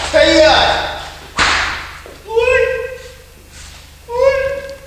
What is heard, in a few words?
bust glass